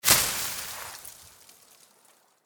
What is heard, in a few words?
obstacle destroyed leaf